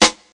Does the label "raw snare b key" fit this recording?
Yes